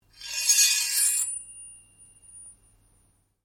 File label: Blade Scrape Slow Ring, Sword; Foley sounds for melee weapons; Swords; Combat